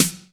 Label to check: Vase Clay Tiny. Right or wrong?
Wrong